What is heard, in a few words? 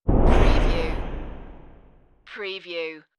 Whoosh